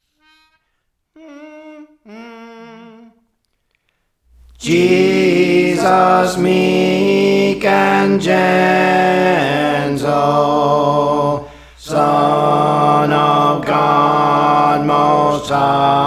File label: Alto; Praise For The Lord Training; Jesus Meek and Gentle